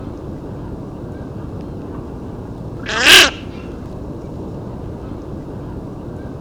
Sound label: Common Pochard Aythya ferina; Takmachik; Ladakh